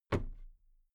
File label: toolbox close drop lid very soft